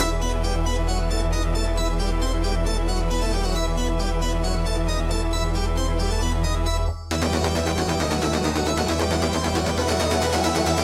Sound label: Baroque Techno, Anachronistic Fusions